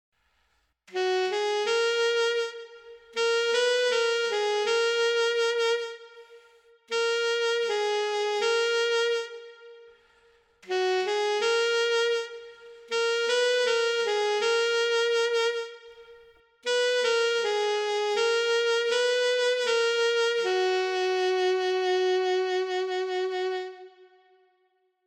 brass alto sax

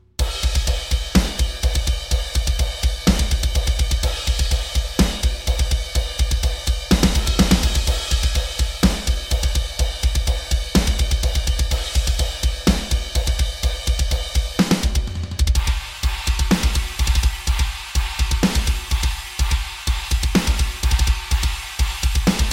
pop drums off

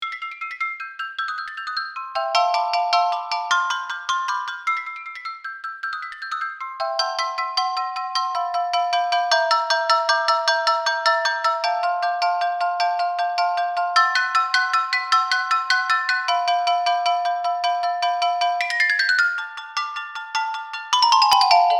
etude c medium

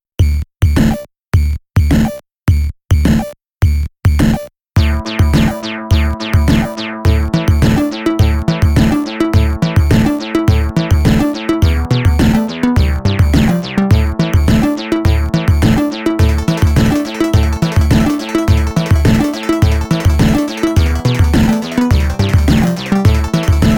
8bit rock

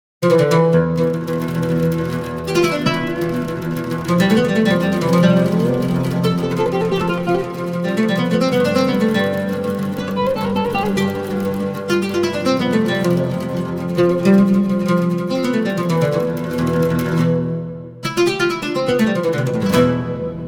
arabic oud; malagenia

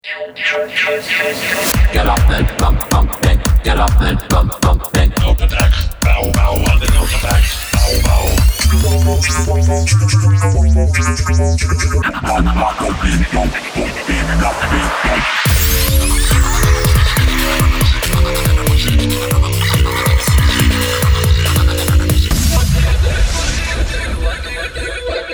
vocoder vocals

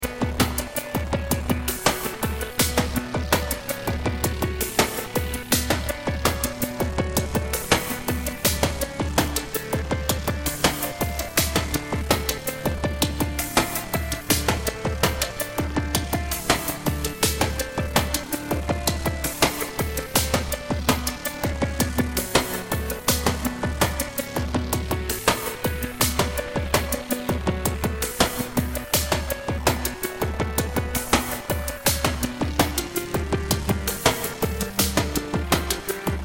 stix and echo synth; brussel

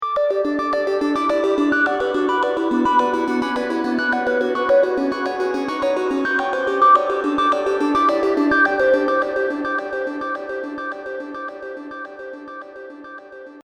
serum seqs arp; chiqui audio

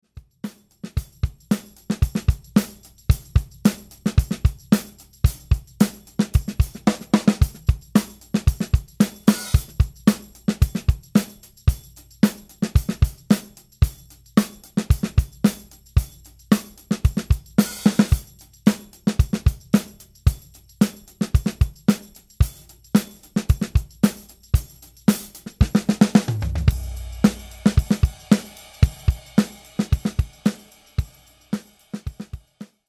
80s pop

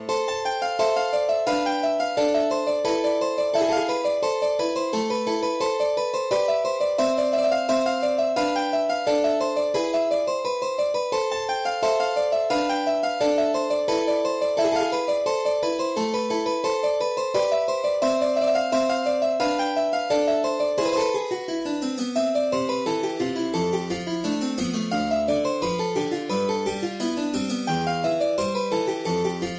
german harpsichord

